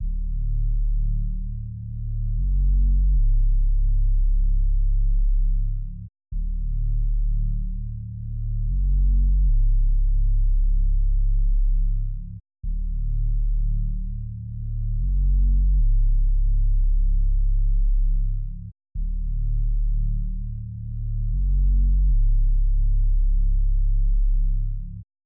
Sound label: 152 BPM D# Min Bass; Loop Stems; Cymatics; Make You Mine